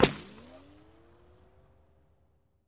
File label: Bullet Ricochet Sin